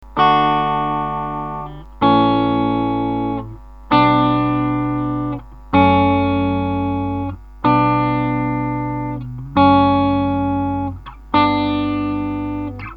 slow gear slow

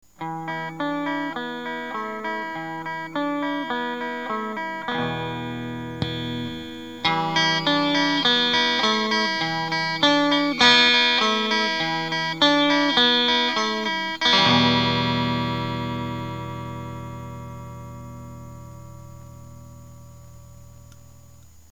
Microamp high

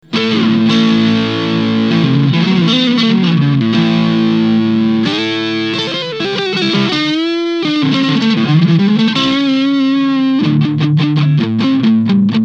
TS9 single neck

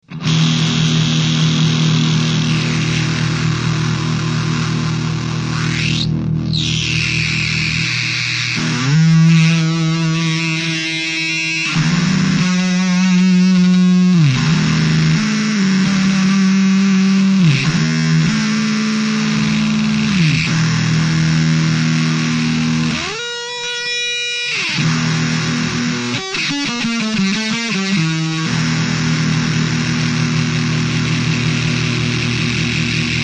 FUZZ FACT VELCRO FUZZ

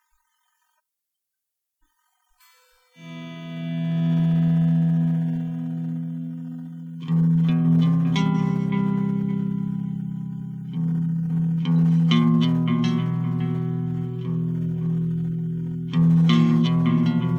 delay

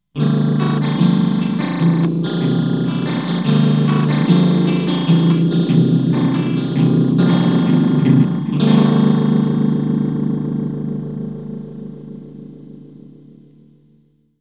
zombie ring

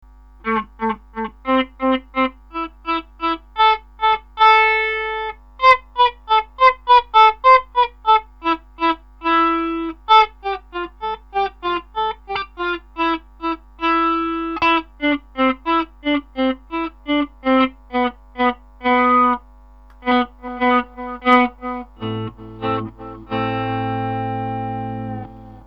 slow gear fast

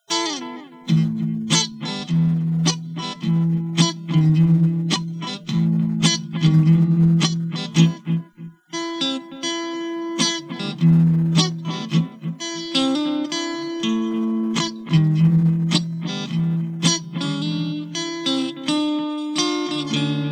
delay

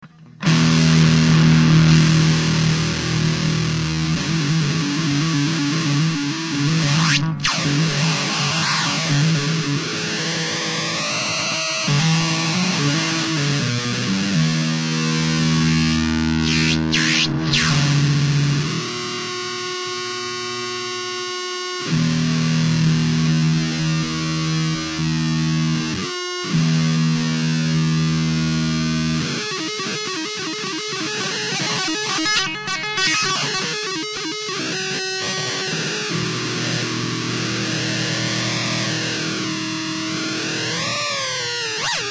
FUZZ FACTORY RADIO FUZZ

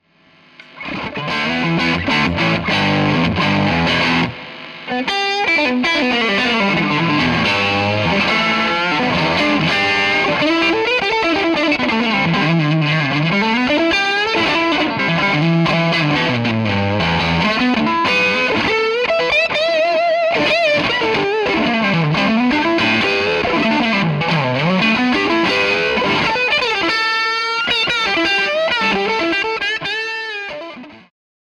klon laney blues crunch

